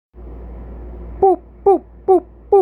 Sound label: boat boap boap boap